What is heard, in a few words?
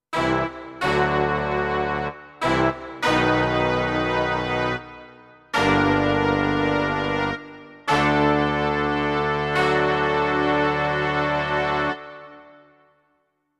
Tp & Tb Section